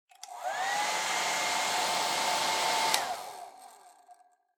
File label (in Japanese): ドライヤーの音